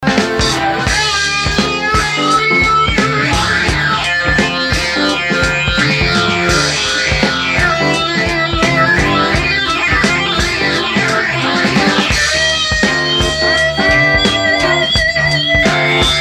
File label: Improvised jam